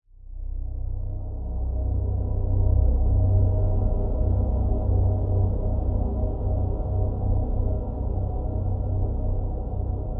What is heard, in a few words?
sound design cinematic drone swell long decay dark tense desolate; zapsplat